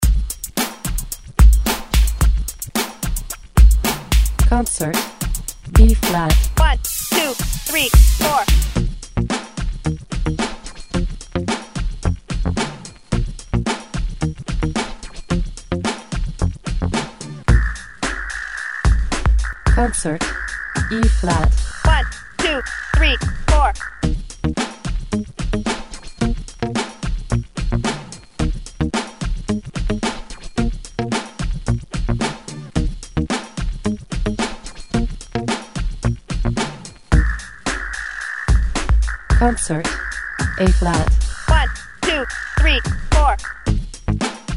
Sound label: Scale Practice with 3rd Octaves 110